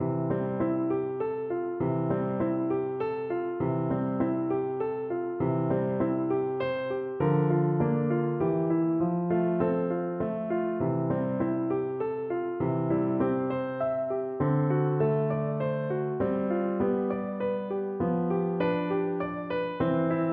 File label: Oboe